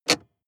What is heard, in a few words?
sports car int handbrake pull short; verkehr-transport